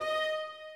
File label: Violin